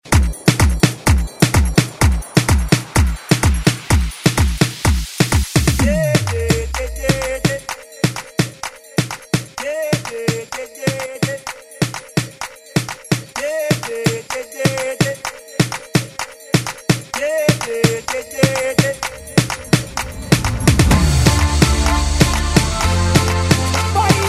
Latin Hype Dance ReWork

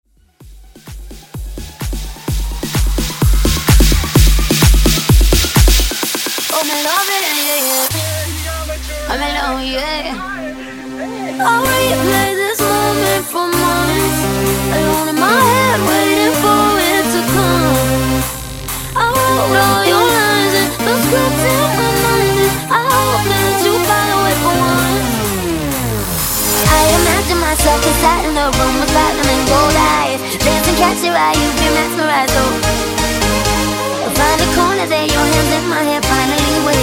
Top40 Big Room

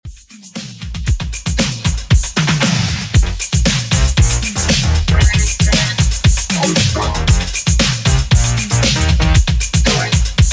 80s Redrum 116bpm

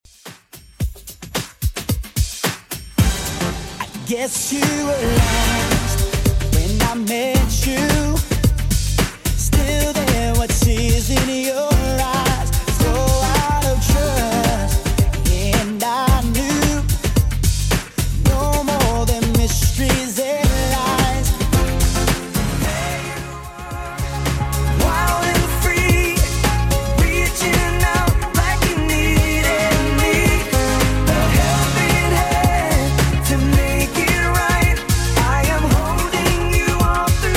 2ks Redrum 110bpm

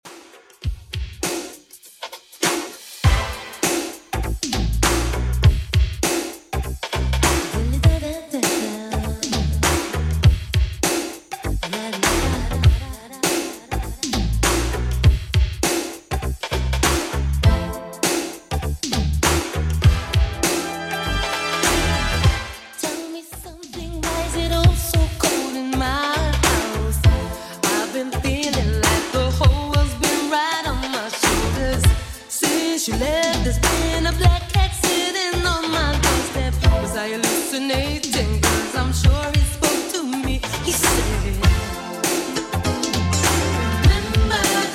80s Redrum, 100 bpm

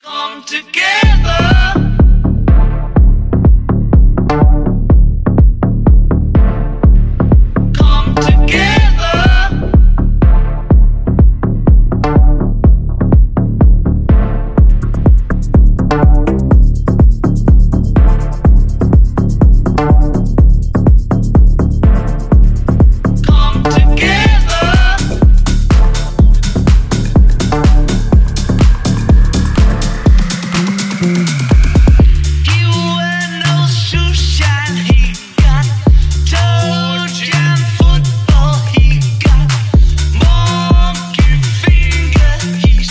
Tech Deep House Redrum; Remixfreakz

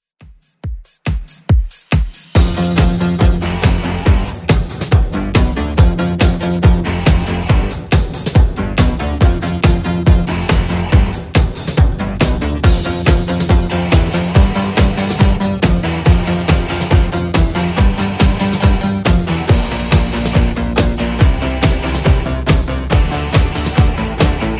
80s 140bpm

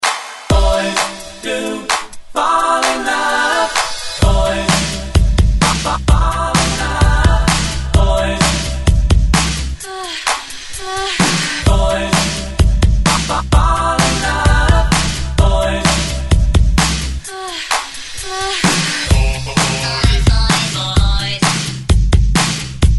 ReDrum 129 BPM

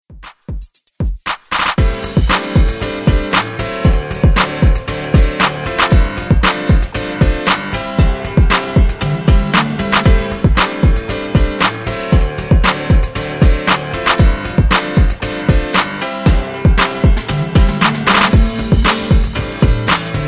70s Ext Redrum

